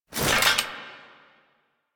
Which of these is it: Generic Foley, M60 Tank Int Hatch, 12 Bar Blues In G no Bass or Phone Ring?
Generic Foley